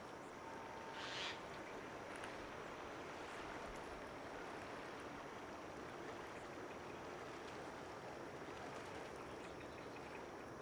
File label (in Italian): suoni port adige; borgo sacco